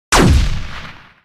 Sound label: revolver shoot; pixel gun 3d